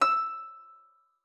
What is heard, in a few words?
HARP FORTE D#6; Xsample VOL-8